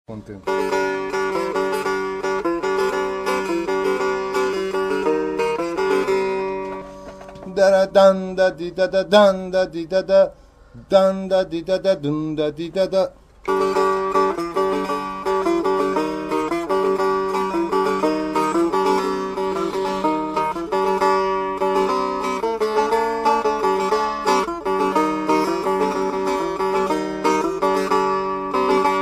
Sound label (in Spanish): chahar mezrab ritmo sehtar voz; Musica persa; nava